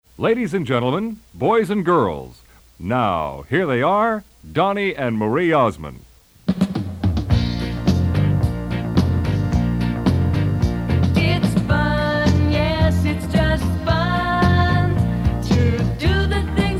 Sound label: Song 4 - It’s Fun (Short Vowels)